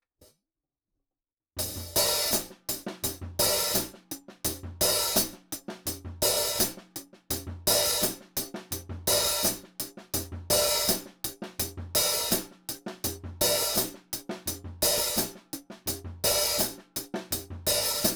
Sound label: jungle hihat; film